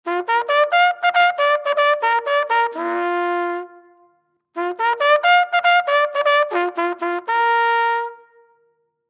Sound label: First Call Flugelhorn Music; Military; Warfare; Brass (Sound Effects:Musical); Blastwave